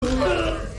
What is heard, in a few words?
eugghhhhh